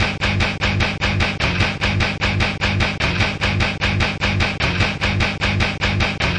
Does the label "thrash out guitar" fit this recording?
Yes